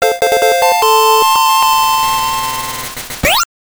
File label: Stage Clear; Type-B